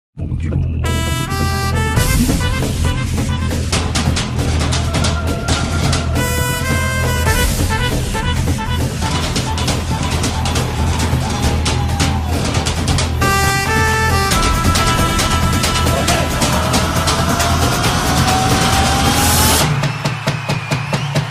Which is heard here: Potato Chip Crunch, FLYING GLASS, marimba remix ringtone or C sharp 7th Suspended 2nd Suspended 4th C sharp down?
marimba remix ringtone